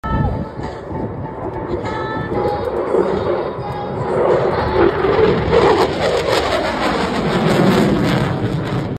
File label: Breaking sound barrier; شکستن دیوار صوتی